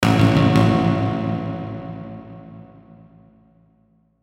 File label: Hit Robotic Deep Reverb; Blastwave